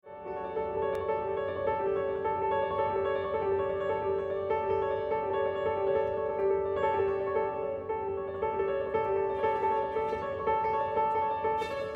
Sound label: improvisation solo